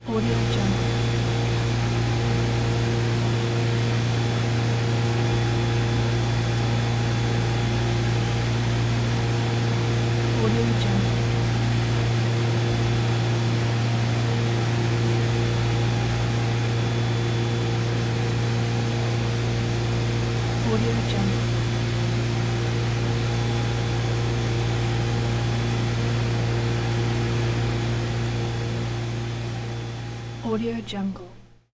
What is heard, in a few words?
power plant noise